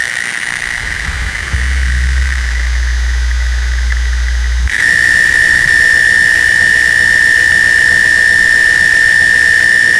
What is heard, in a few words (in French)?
fissures d une batterie en, overheat